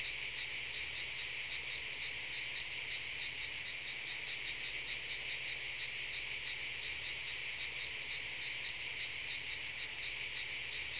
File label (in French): cigales